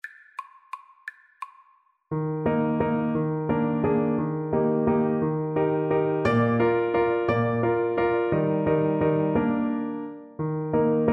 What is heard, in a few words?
german dance TPT; schubert